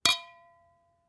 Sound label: Champagne Glass Clink